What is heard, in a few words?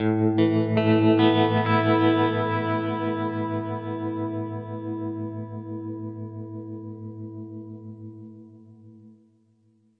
guitar tremolo chord